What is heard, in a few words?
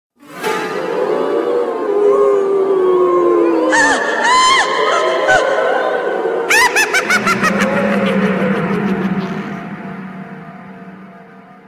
ghost laugh; ringtones